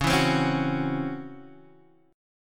C sharp Minor Major 7th Double Flat 5th, Guitar Half Step Down